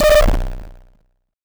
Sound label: Space Bloops; FLEETCOM; bfxr